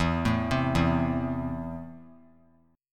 E Suspended 4th Sharp 5th; arpeggiated; JGuitar

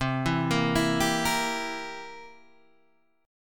C 7th C, Guitar Open G, chord

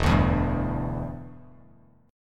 E Minor 7th Bass Standard; strummed

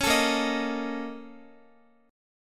A sharp Minor Major 11th, down, Guitar; strummed; 4F,4A,5C,5G,5C,6E; JGuitar

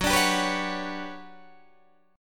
G Major Sharp 11th F sharp, chord

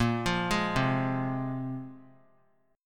Bb 7th Suspended 2nd Bb, 3C 3F 3A 3D; Bb7sus2; arpeggiated; 22,24,23,22; JGuitar

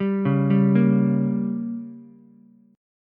G Diminished, Ukulele; arpeggiated; JGuitar

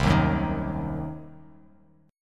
C Diminished C, Bass Standard; strummed; JGuitar

